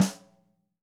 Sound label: Ludwig Supraphonic Snare mp; 1965 Ludwig Supraphonic Snare One-Shots; Sonic Foundry (Sony Creative Software) - Vital Drums